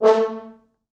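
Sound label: 4 French Horns, Horns; East West; Quantum Leap Horns Sax; 4 FrHorns MOD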